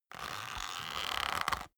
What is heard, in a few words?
leather creak soft